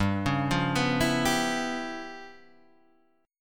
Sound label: Db 7th Sharp 9th Flat 5th G